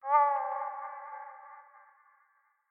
meoooooooow; watcher

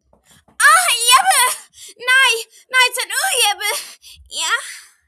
ahhh

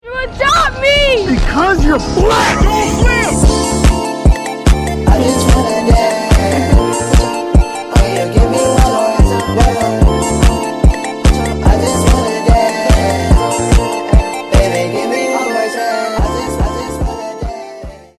loud beat

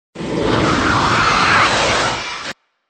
alien screech

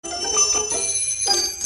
bell monster; dnd

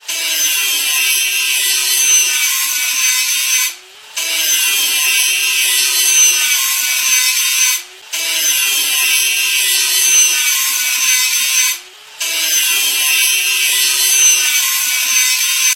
serra chainsaw

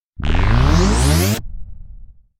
electrophone recording launch

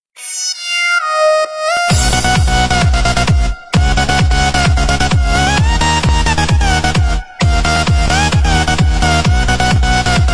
electro mix

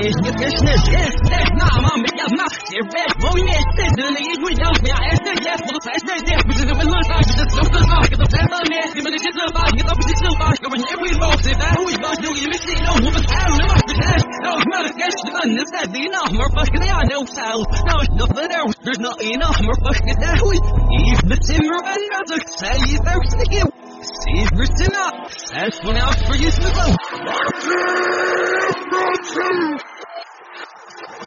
rap battle reverse; mrbeast

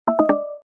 android 8 0

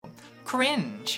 cringe; contrapoints

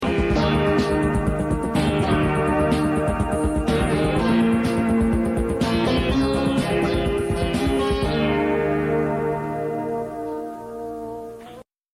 minimal synthwave

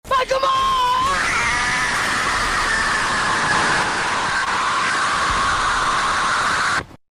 myaaaaaaaaaaa

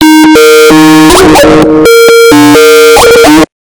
skype loud

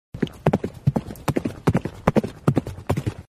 horse running

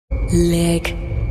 lick

